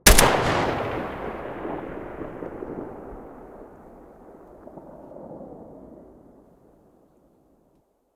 galil muzzle mono

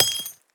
Shell heavy dirt; STALKER; IWP

